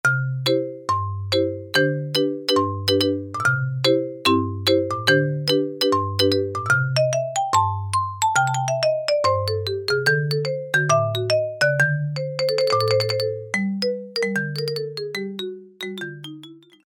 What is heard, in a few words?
Marimba, Roland U-220; Factory Patches